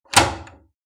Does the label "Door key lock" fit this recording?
Yes